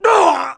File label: pain